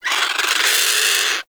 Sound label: Avichaea Vocal Alerted; Hunting Critters